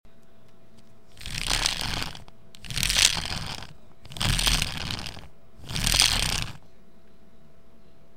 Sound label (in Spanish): carraca